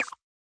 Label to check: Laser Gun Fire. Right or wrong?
Right